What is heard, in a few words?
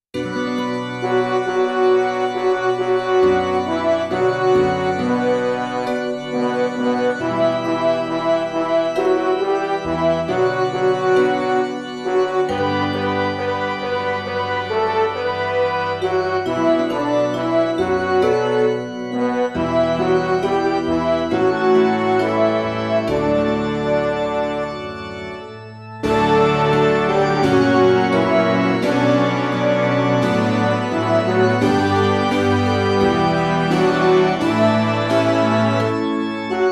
doxology amen midi